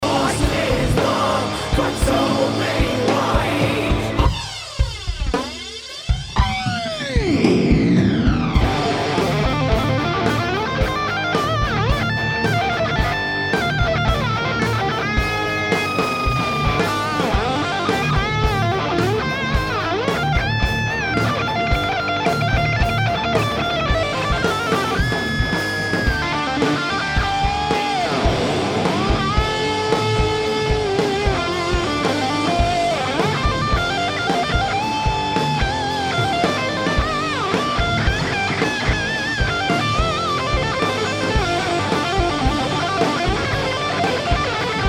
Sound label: solo